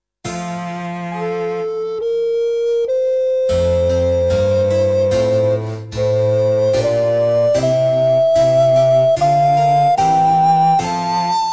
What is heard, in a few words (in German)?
sonate nr 4 in f dur i larghetto